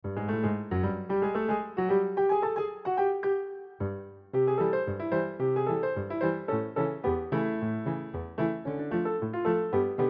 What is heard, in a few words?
Ragtime